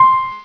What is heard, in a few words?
Piano Hi